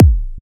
kick

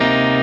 x 3 2 x 2 Bm7

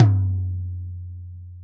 Tom BD

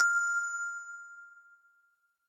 bell

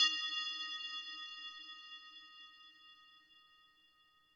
soft hit finish

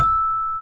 Celeste E4

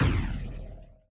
breakbeat

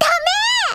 combo break

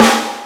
soft hit clap

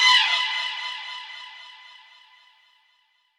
cutting

